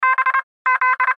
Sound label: Radio Morse Code; Morse Code Good Job Bz; Morse Code Sound Effects; Blastwave; Warfare